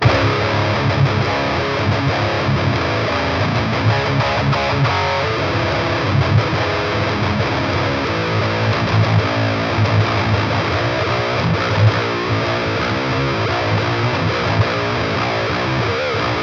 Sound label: metal